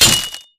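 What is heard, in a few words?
Impact Pulse Blade Wood; Titanfall 2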